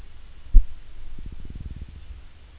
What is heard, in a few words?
Pseudotropheus emmiltos Growl